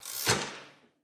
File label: Switch; MiSide